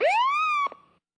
Misc Cop; SSO Misc

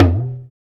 Perc Drum; SSO Percs

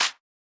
Snare Rollit; SSO Snares

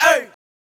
Chant; SSO Chants

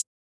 Hat Closed; SSO Hats

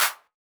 Clap Closed, Claps; SSO Claps

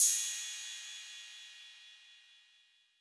Crash; SSO Crashes

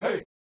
Chant; SSO Chants

